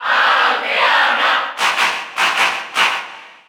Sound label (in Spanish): Female Cheer Spanish; Villager; SSBU